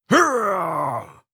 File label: effort grunt male